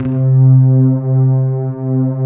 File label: PLUCK PDS; Pro-Rec - Synthsonic; TR PADS